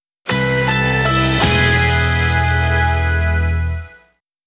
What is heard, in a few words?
jingle